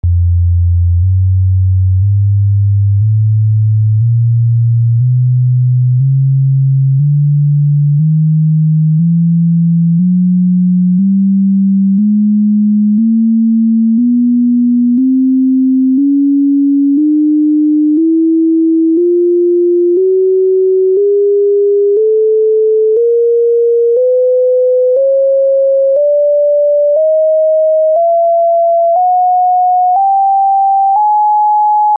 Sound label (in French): Echelle nonoctave chorale 123 cents pour le choeur